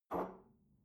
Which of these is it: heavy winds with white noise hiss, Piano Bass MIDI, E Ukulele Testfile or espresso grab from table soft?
espresso grab from table soft